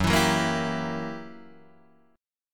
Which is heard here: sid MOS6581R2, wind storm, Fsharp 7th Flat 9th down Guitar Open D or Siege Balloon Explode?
Fsharp 7th Flat 9th down Guitar Open D